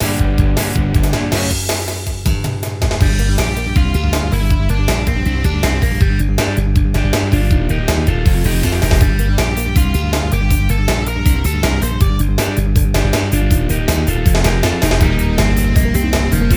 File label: battle